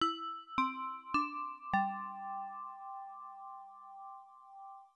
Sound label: Chime; Kakyusei; PC-9801